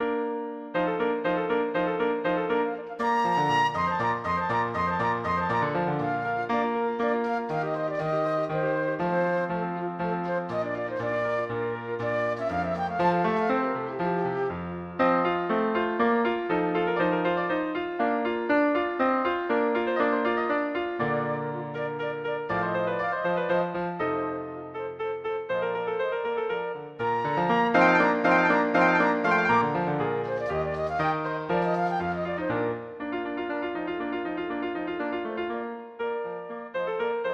flute

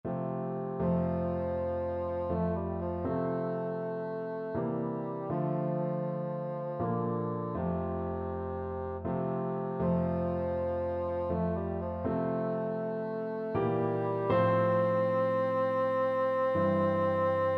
amazing grace, bassoon

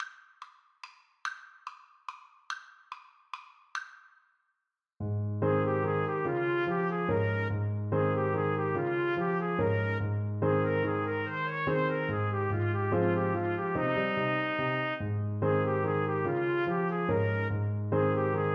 trumpet duet

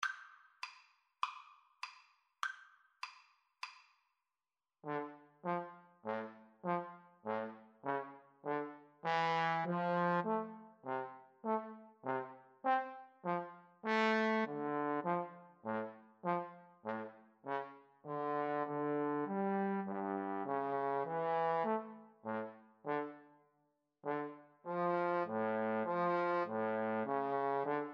Trumpet Trombone Duet

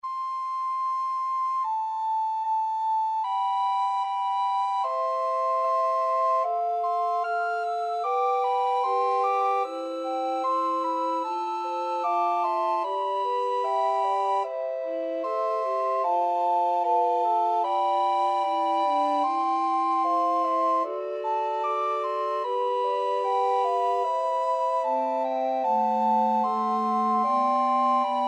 recorder

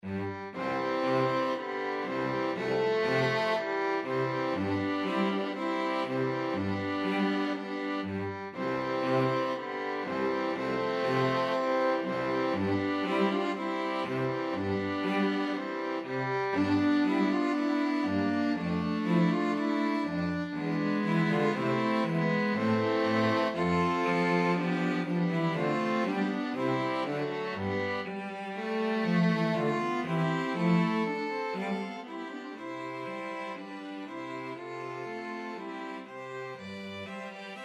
STRQ; brahms sym1; string quartet

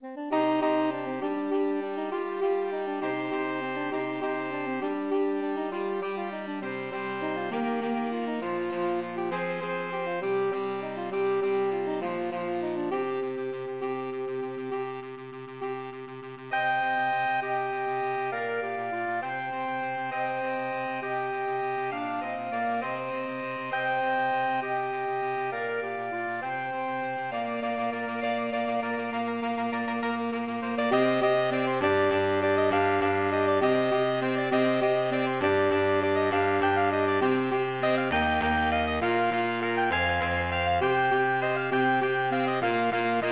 pirates SAX quartet

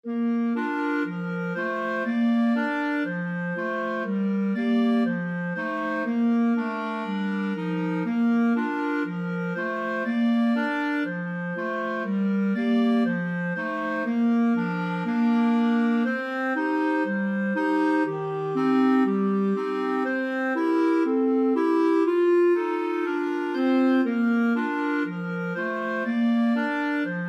clarinet trio

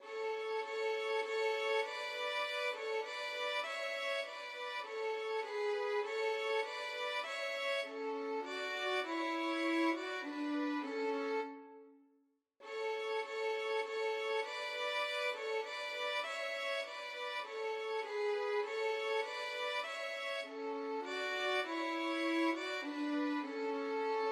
drink to me violin duet

